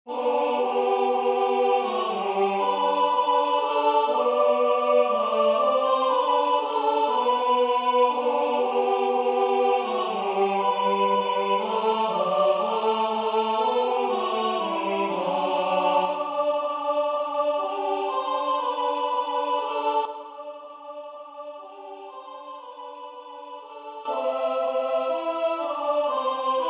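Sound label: Tenor; Hark the herals angels sing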